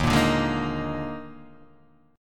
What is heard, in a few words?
Eb Major 7th Suspended 4th Eb, Guitar, Half Step Down